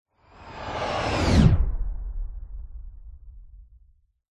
science fiction spacecraft powerful pass by super sonic fast whoosh; zapsplat